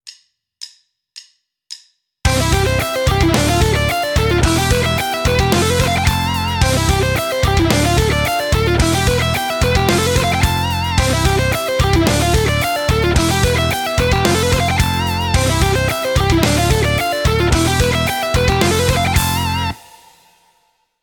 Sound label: Rockschool, Guitar; Guitar Techniques 2019 Issue 297 July